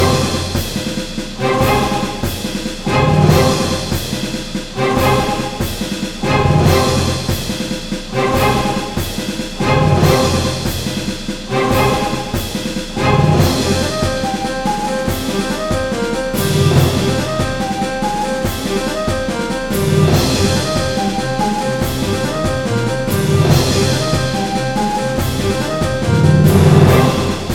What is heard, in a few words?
Battle Theme; Krut The Mythic Wings; PS4; Xbox Series X/S